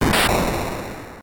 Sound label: explode